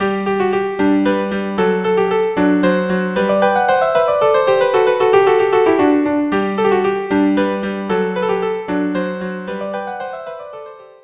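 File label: violin viola duo polonaise; KV 487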